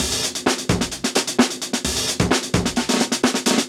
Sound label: Both Breaks Together